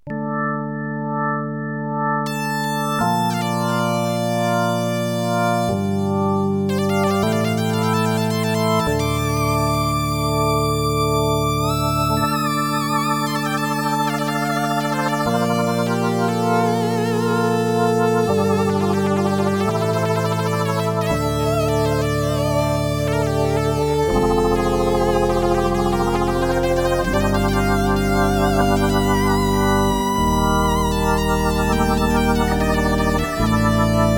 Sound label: virtual analog synthesizer